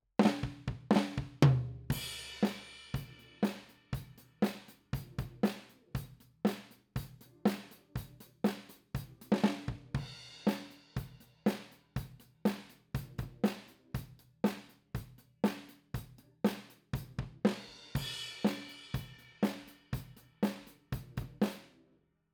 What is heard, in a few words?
KIT